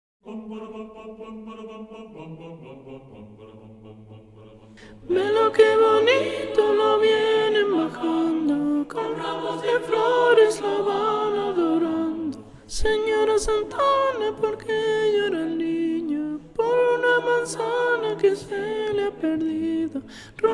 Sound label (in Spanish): Velo que bonito sop; navidad